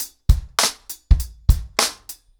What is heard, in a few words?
100BPM, ACOUSTIC DRUMS; The Roots Drumloops